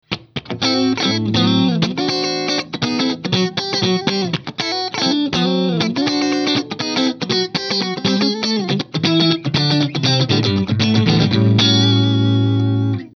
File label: ZIPPER SOFT